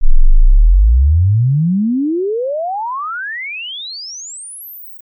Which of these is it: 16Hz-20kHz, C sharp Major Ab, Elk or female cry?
16Hz-20kHz